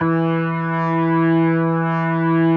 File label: E3S, B3